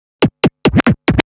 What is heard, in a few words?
Scratch Fills 140BPM